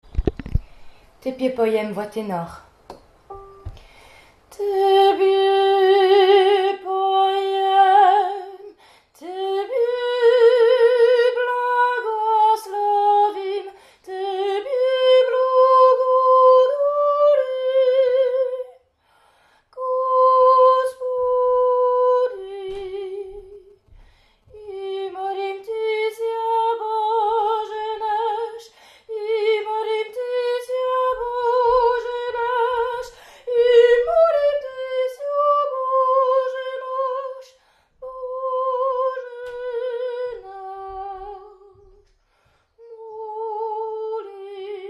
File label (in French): Tenor